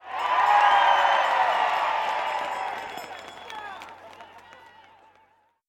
cheer; earth-to-echo; downhill

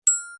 button click

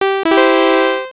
victory; cheesy-themepark; dontWhackThePack

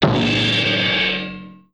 drum; dontWhackThePack

